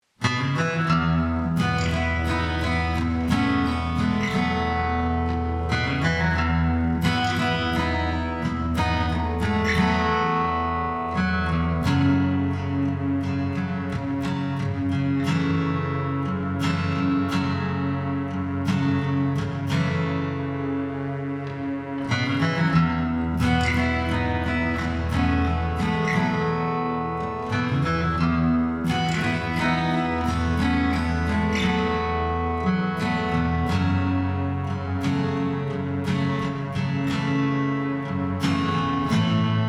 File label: dream synth